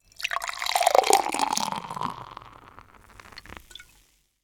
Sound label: pouring beer, household sounds